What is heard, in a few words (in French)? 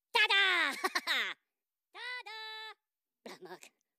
SMS Mignon